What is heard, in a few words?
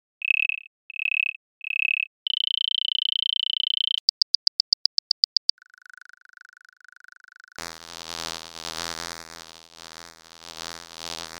insect